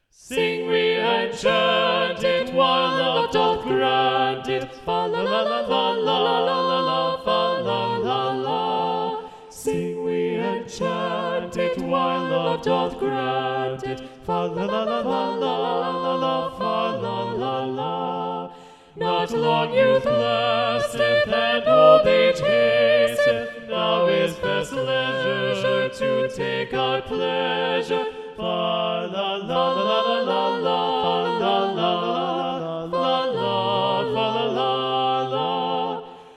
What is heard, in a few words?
SATB Alto Muted; Sing We and Chant It; Thomas Morley arr Russell Robinson